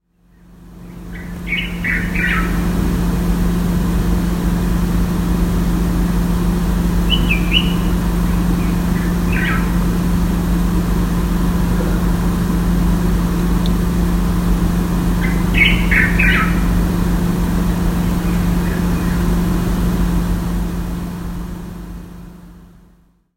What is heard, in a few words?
bird dialogue